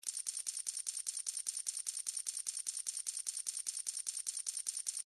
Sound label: turn; Brazil; slotox